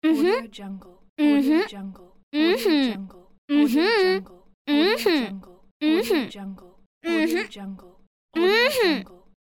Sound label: teenage girl voice hm hmm